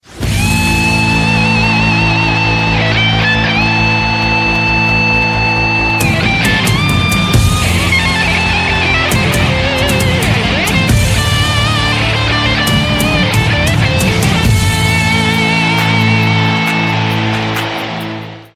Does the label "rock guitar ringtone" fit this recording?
Yes